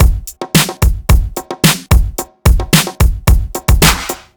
Pop Set

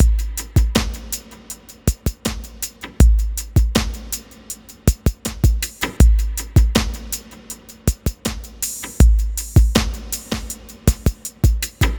Cellular C, ambient

